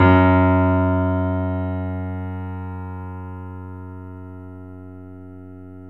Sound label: F#, Boesendorfer, 3D Pianos; E-MU Producer Series Vol. 5; 3-D Audio Collection